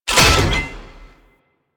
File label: Cargo Train Exit Bolt Unlock B; Warframe; Corpus Railjack